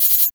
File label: PERC2; USB Soundscan vol.34; Burning Grunge Hip Hop; AKAI; TIMBA